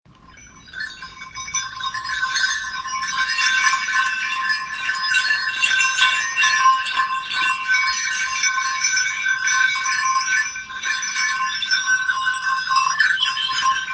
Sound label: bell